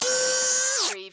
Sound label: Servo Panel Sound - Servo